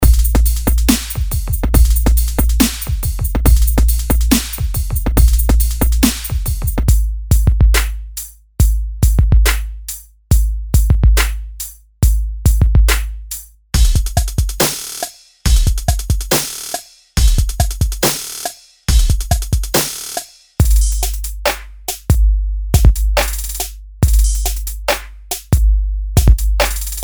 Trap; BHK Samples

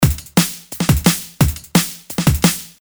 Breakbeat 174

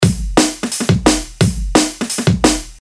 Lifted Break 174

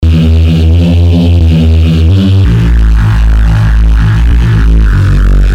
Bass Loop 174 D

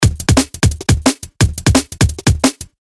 Drum Loop 174

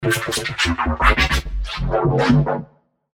Neuro Bass FX; BHK Samples

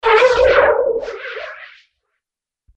Neuro Bass FX; BHK Samples

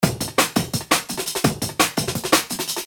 Break 170

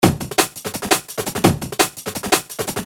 Break 170

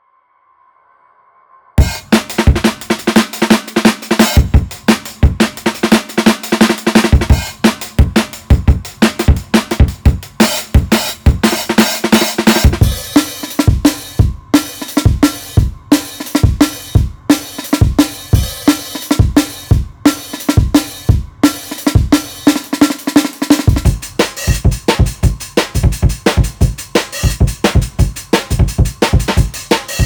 Drummer Random Kits; Addictive Drummer

